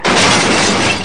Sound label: Crash